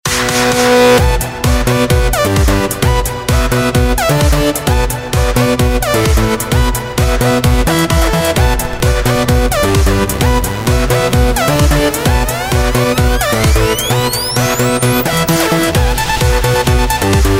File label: jingle bells